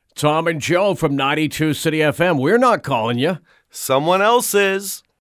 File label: RINGTONE